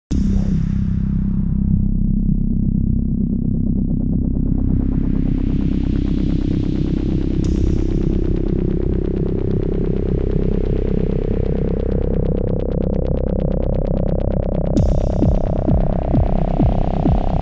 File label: Natural Bass